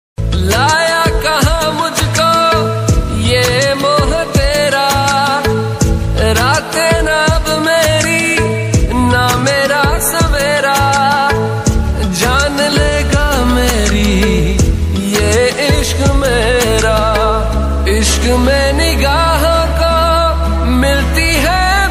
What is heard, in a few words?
mobile ringtone love ringtone